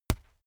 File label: boxing gloves batter; sport freizeit; boxen